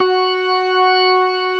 STOPS, organ combos

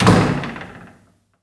Door, household rhythm samples Hits

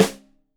Rim Shot Stereo, drums

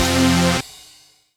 Dance Mega Synth; Best Service; 90 s Sample CDs

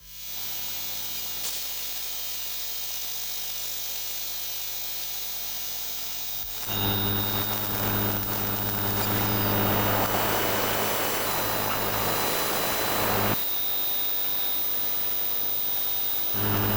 Electromagnetic Fields, Buzzin; electric fields

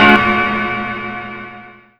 PIANO VERB; Big Beat Samples & More; AKAI; KEYBOARD KIT

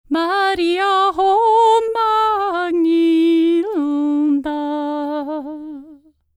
Nord, World Voices; ILIO; Vocal Planet VOL-5

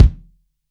KICK, FUSION KICK

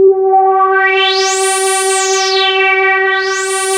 ARP 2600 G4, synthesizer; Hollywood Edge - Giorgio Moroder Rare Synthesizer Collection

